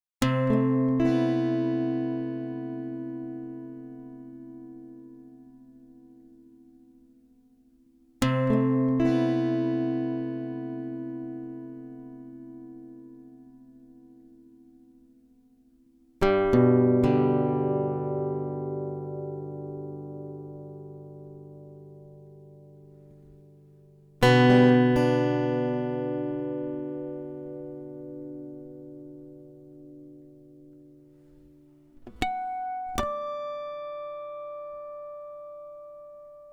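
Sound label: Em 120 guitar arpeggios harmonics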